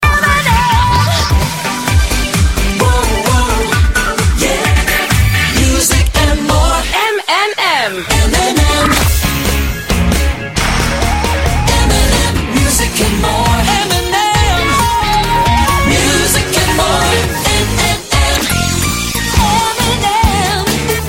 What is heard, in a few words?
jingles; MNM